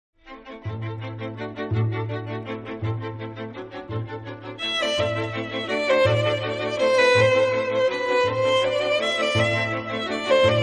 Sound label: violin concerto excerpt